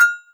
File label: cowbell